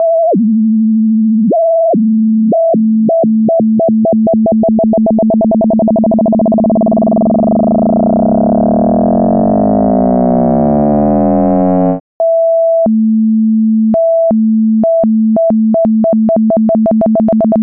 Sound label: tremolo plain lp